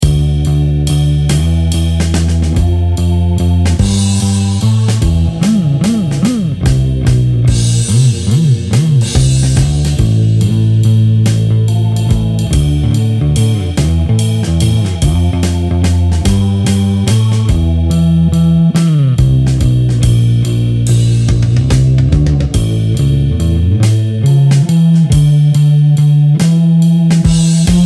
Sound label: bass